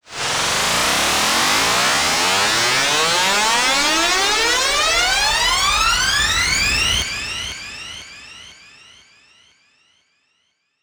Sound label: Long Noisey Riser, transition samples